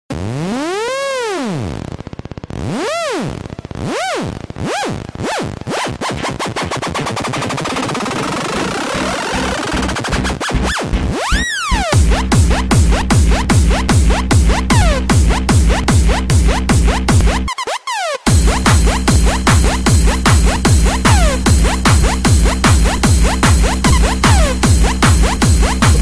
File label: ringtone remix